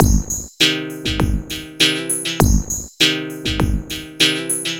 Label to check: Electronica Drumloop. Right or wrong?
Right